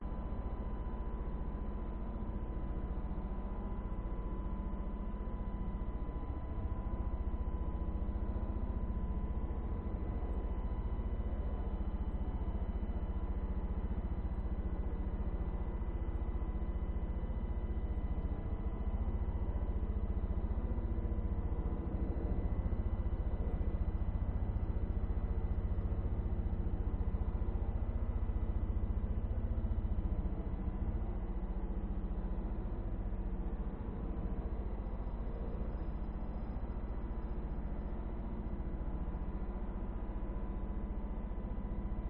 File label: ambience large large spaceship main area heavy machinery running with consta; omar alvarado